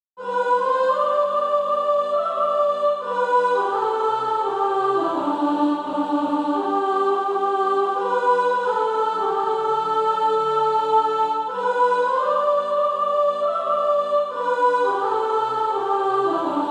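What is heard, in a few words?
soprano, Practise Music; SATB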